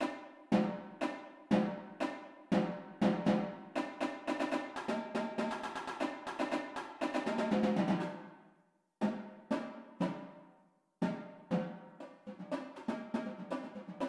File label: tenors w music